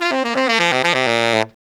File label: SAX LICK, BRASS LICKS; Ueberschall; Jam Box